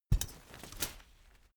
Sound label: umbrella big close full hard